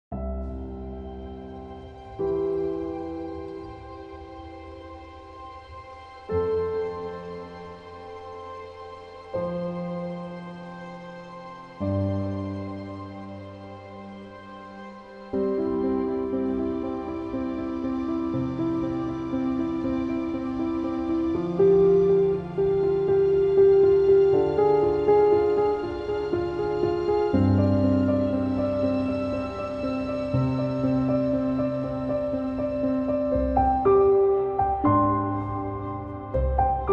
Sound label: Piano